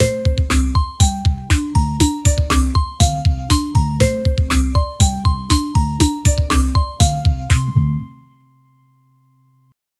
upbeat funny pop beat; vlog

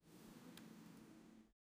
human activity

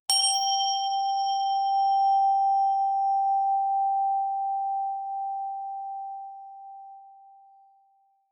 bells

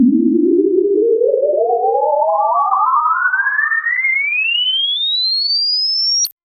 digital beep ascending; electronics

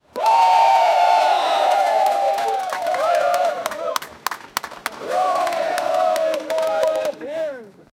boxing punching sound

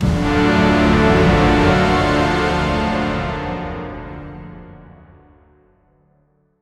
single war fade in, orchestral hit

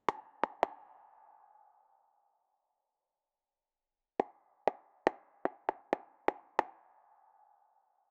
archery

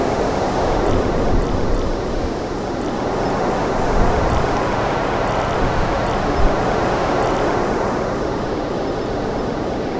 gentle aegean sea waves; coastal

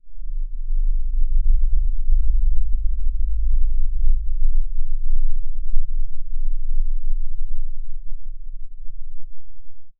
low eerie ambiance the so

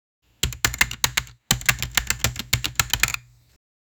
a sound of breaking plate; accidental

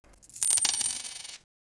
the sound of coins being; money

